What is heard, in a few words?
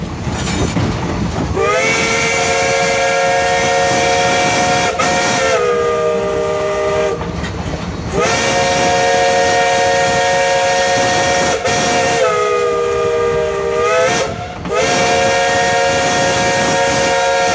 Steam engine Whistle